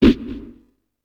PUNCH THREAT; UFC Tapout

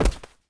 bodyfall marble; foley

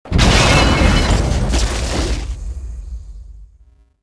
crash; Star Wars Jedi Knight II Jedi Outcast; XBOX; atst

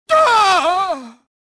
death; jedi

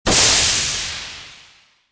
air burst

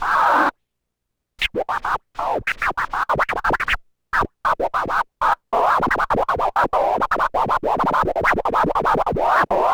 Scratch; Matt Hoffman's Pro BMX 2; XBOX

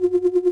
pylon; Splashdown; XBOX; Soultree

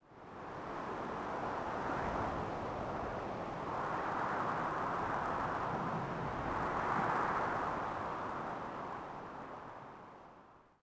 theed Wind Gust; Star Wars Obi Wan; XBOX

